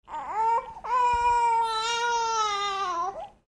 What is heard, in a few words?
Single cry; Short Cry